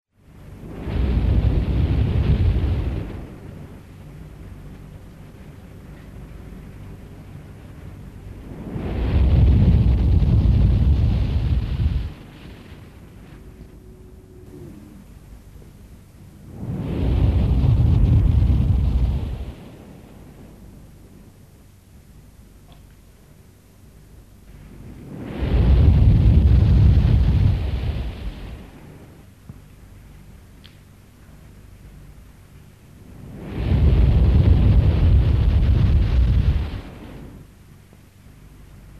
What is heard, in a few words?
Meditation waves